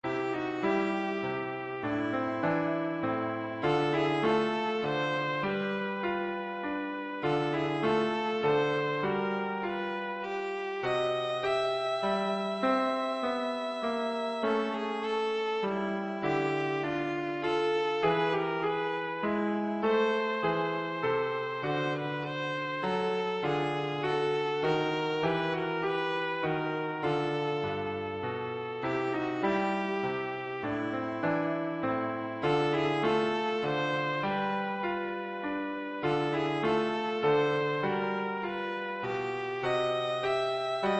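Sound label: viola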